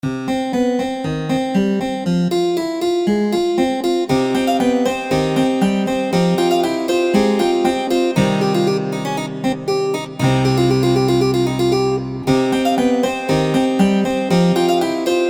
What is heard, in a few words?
Harpsichord